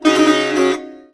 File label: SITAR GRV, World Colours; Best Service